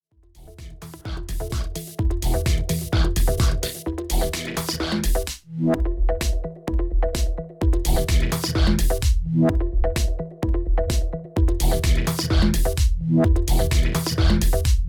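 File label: Denon DJ X1800 Roll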